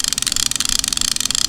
Fishing Rod Reel, Loop; pirate hook windows gamerip 2012

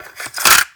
Load Cassette

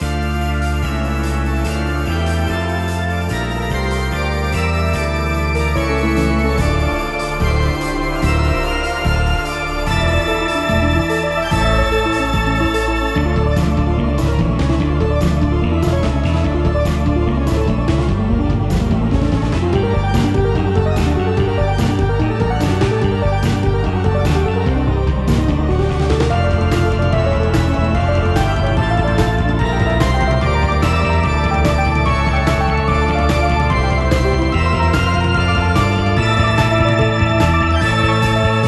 Boss Music; primal light linux macos switch windows xbox one xbox series xs gamerip 2020

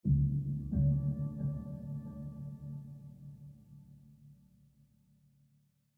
Lap Steel Rhythmic

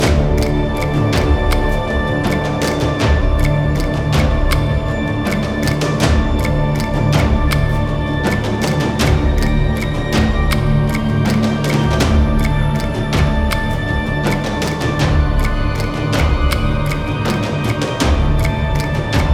Ambient caves combat; song of iron

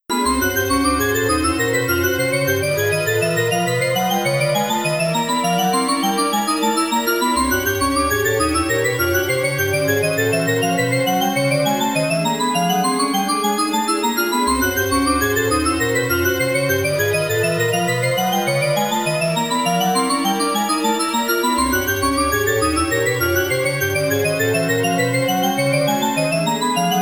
Looping Steps; Super Mario 64 Remastered soundtrack

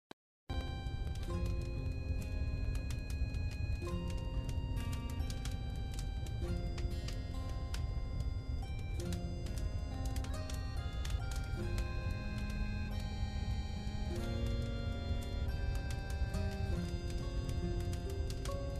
Campfire Theme; Tacape; Android

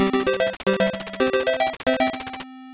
Level Start; Pengo; Game Gear

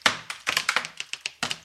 card forge cracks

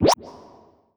Menu Over; Nicktoons Nitro; arcade

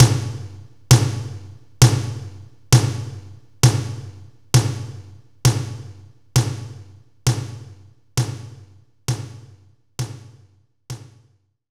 Room Tom; knights try

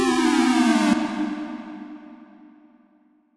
Timestop Echo; Curse of the Crescent Isle DX

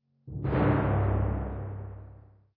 Tymp Hits

